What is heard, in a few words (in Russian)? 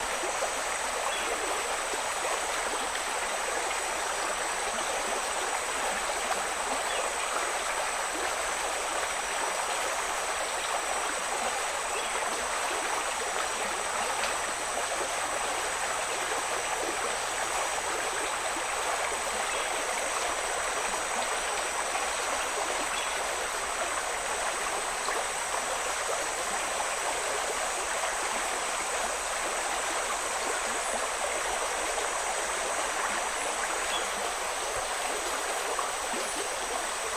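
мощный поток ручья текущего среди леса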